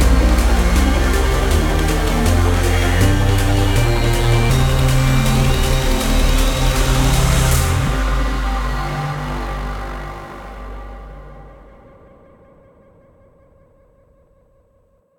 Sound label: Sonar Fight Fail 13 Bars 160 Bpm